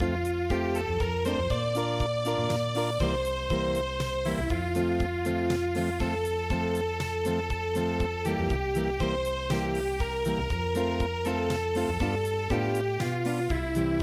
Unplugged, midi

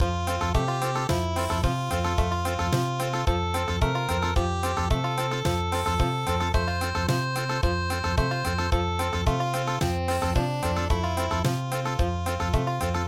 Country, midi

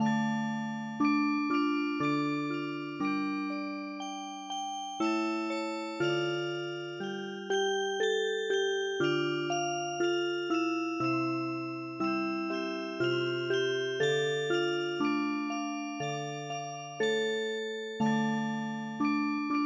Music Box, traditional

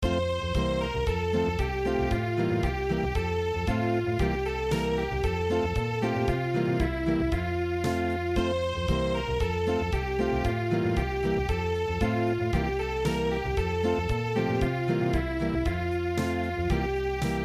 Deck the Hall Unplugged

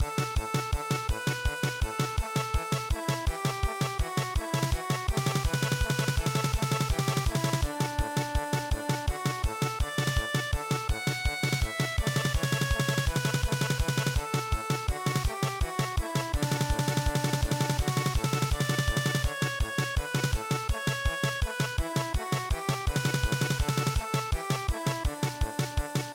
German Polka; Bell Troika

